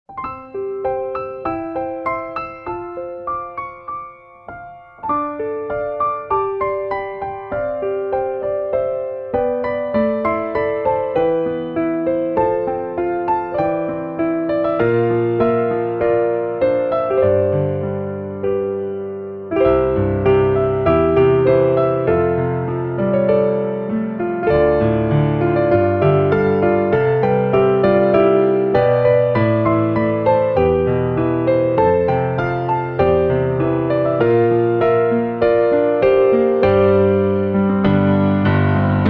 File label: spring sonata